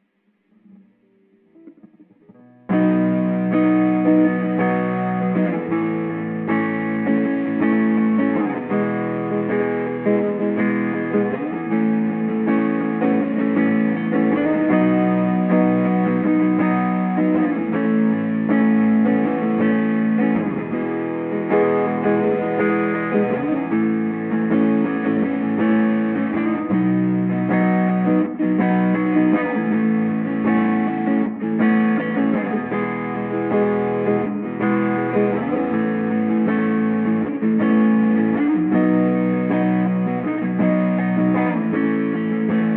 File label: E160 Strummy GTR chords